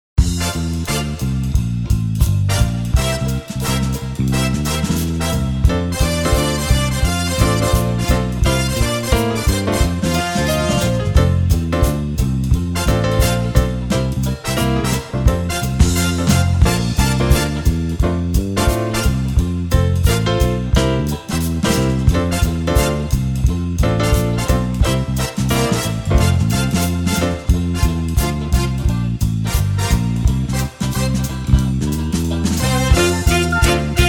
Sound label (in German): Karaoke